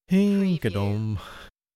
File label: Male humming; beanstalk audio